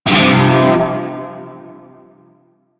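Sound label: Shawzin Pentatonic Minor Chord G; Music; Warframe; Narmer Shawzin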